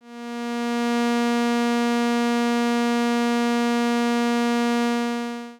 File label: Ramp wave